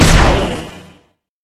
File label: grabber fire